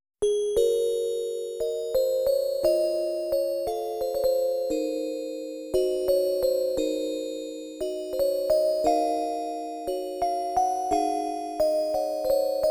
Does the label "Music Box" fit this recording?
Yes